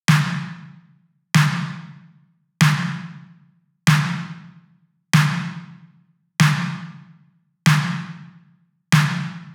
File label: Synthwave 95bpm Snare; Drum Kits; Stems; Ghosthack - Ultimate EDM Drums; Synthwave Kit 07